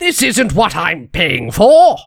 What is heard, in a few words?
Civilian negative vocalization